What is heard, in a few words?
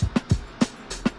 bustop, drum loops and breaks, 100 bpm; 091 to 100 bpm